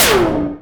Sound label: Character Hit